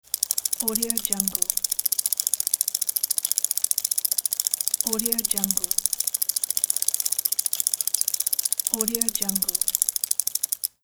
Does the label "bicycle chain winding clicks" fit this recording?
Yes